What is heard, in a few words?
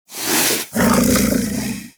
Predasite Vocal Breathe; Warframe; Hunting Critters